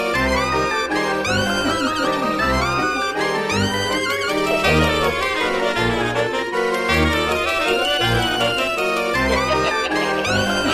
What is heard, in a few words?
Dark House Creep Ringtone; Oppo Reno2 Z